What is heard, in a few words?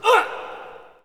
Hockey Male Hurt; SFX Kit; Hockey Vocal Sounds